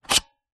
leisure toy stereoscope plastic disc pull out remove